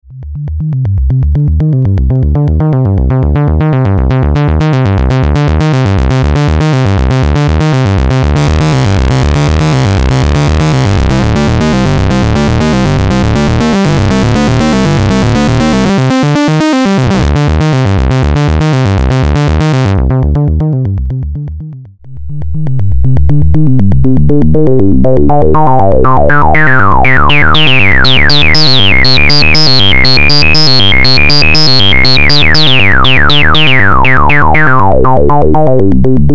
phase dist

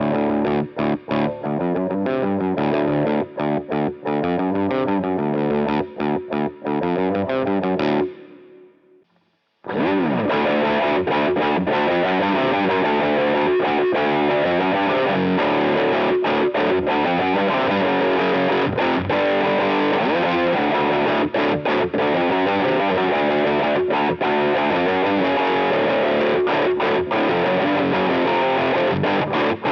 Harley Benton DNAfx GiT Vox Distortion on off

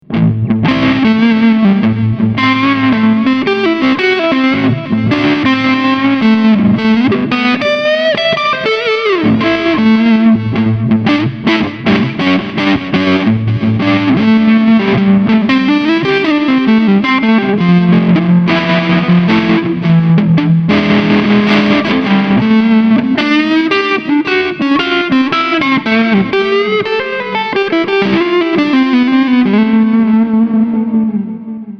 Blues Warm Blues Solo; Mooer GTRS Guitars Standard 800

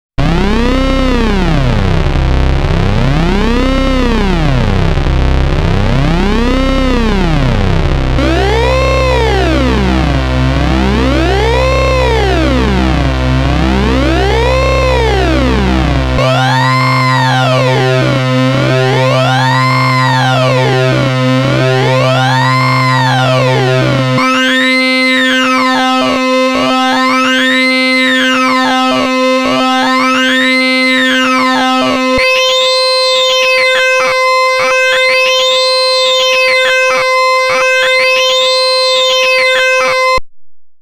Dreadbox Polyend Medusa Hard Sync

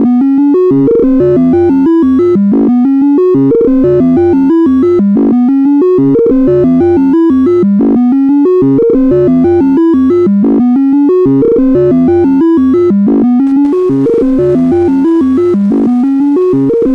Weak Synch atypical use; Behringer System 35

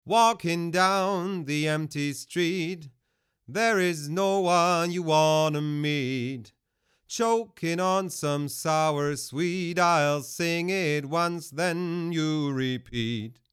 Vocal; Manultec MT-E.8012; ORCA BAY; mit ORCA BAY

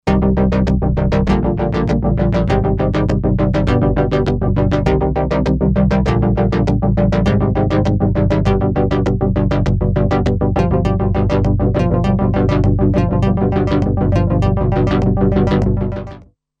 PipeLine Master; Cobalt8

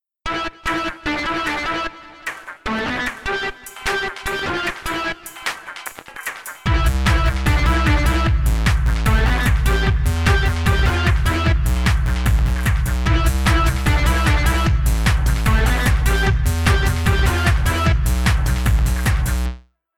techno

